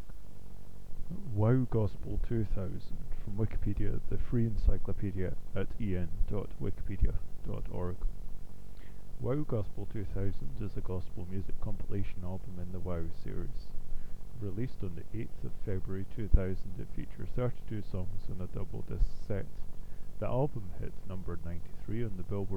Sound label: Gospel